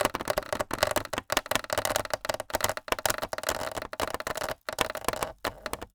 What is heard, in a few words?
Polystyrene Creak